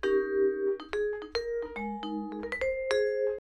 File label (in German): Vibraphon 70 bpm B